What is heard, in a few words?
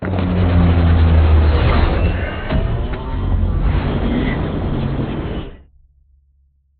strafe far; Beacon; Titanfall 2